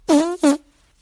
fart

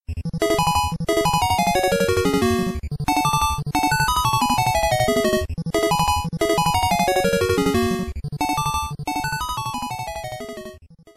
music bonus stage; bomberman nes

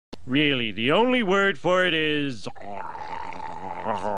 gurgle noise; homer simpson

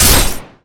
attack; sven

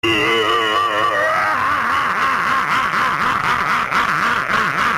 uighur laugh

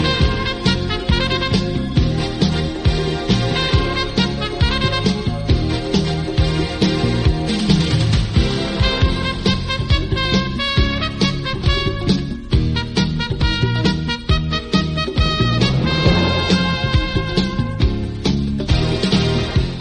instrumental; sekai ninja sen jiraiya

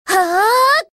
hit; kasumi

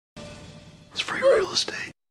oof

